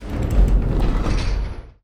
open glass cabinet

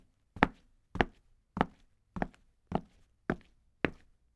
footstep stone step

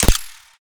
krossbow

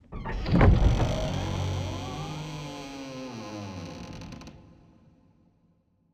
gate open

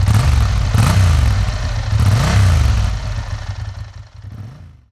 motorbike start

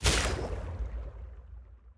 heal cannon

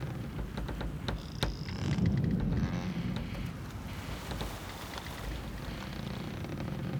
wooden ship cabin

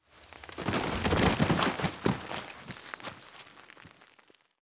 body fall valley

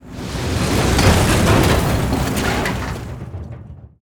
plane shake

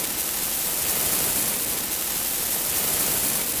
fireworks small

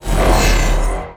skill huge axe cast

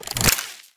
pen arrow

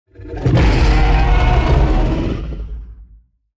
huge monster roar long

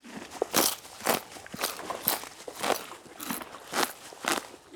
sheep chew paper

